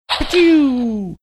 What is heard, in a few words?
making ricochet sound